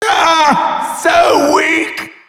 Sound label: headbomb hit